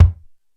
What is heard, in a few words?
TRAMPLE BD; East West; Global Sounds; R8M